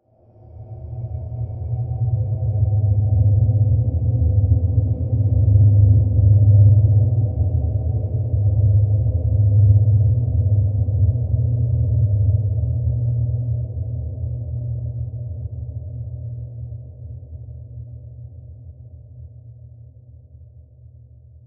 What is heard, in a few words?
Dronescape